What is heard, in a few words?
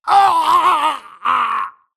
grunt electro; Titanfall 2